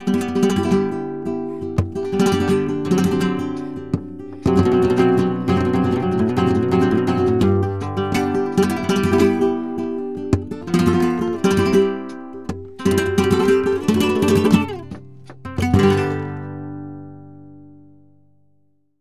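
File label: guitar, 160, ALEGRIAS, Flamenco; Best Service ProSamples vol.46; AKAI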